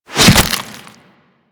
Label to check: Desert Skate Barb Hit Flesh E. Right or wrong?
Right